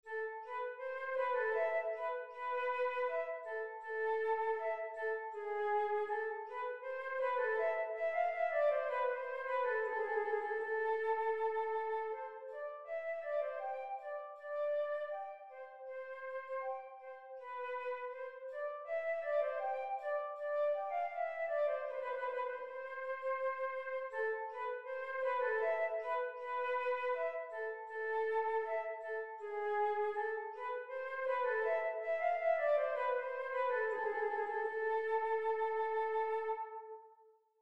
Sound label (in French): rigaudon mineur; telemann georg philipp